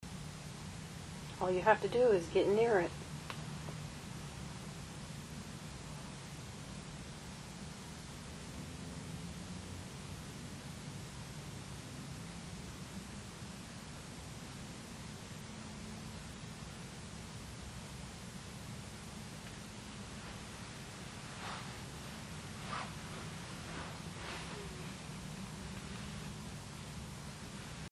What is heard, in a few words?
girl sad cry childrens room; Gardner’s Used Books & Music; Tulsa, Oklahoma